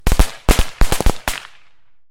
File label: Fire Crackers